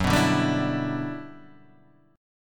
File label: G Major 13th